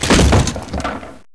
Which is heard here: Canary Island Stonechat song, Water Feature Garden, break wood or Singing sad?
break wood